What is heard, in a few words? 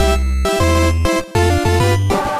8Bit; NSMB